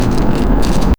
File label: gentle rustle of leaves, plant movement